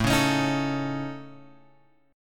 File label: A Major Flat 5th A, chord; AMb5 Chord {5 4 X 6 4 5} strummed